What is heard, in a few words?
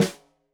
Snare Stereo, Drums; Deeply Sampled Drums